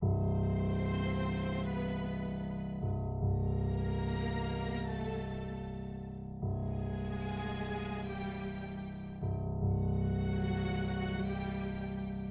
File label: drone; homestuck; hiveswap act 2